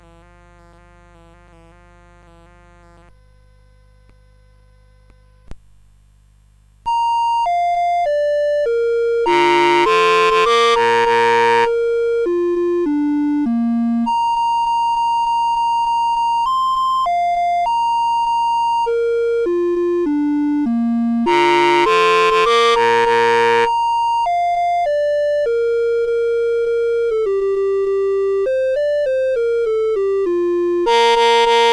CSG8580; CSG8580R5

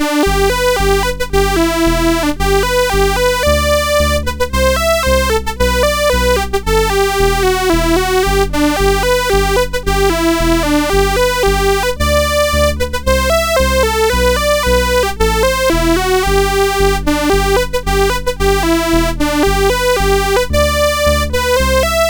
MOS6581; Tune the Old Cow died on; MOS6581R4

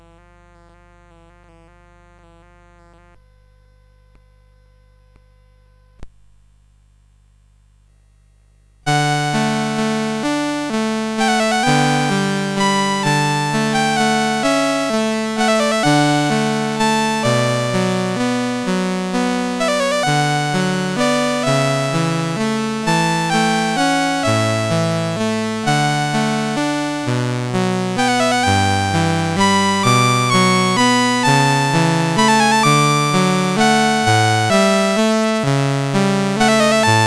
Serenade, CSG8580; CSG8580R5

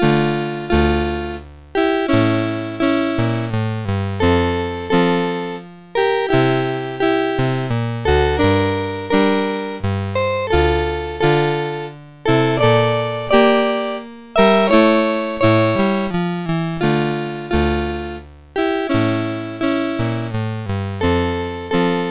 MOS6581; MOS6581R4

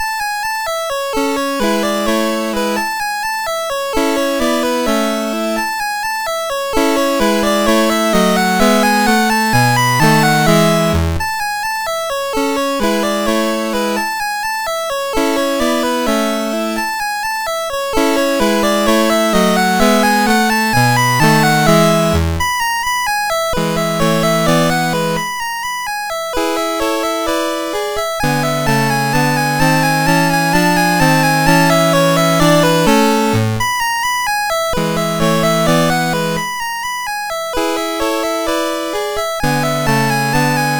Waltz in A Major, MOS6581; MOS6581R2

